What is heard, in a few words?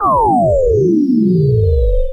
Scifi Power Down; Energy & Forcefields